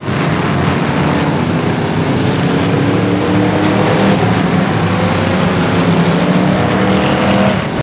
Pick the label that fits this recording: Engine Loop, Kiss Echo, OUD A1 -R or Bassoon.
Engine Loop